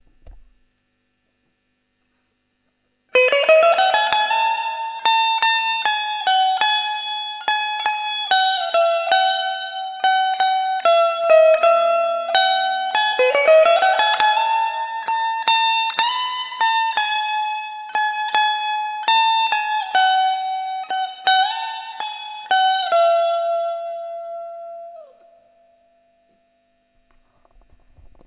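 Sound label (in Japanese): リバーブたっぷり, 真空管ギターアンプ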